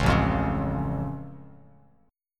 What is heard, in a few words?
A Augmented A down Bass Standard; strummed; JGuitar